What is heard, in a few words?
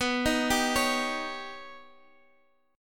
D sharp Augmented 7th B, guitar